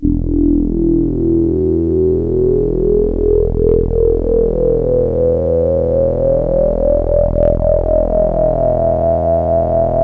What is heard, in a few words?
Casio CZ